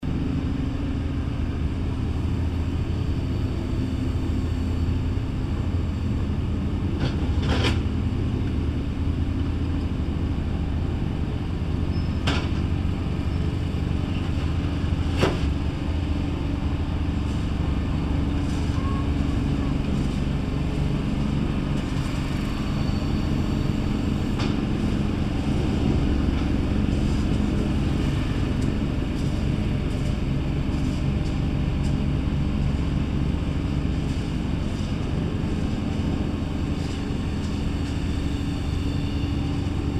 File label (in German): bagger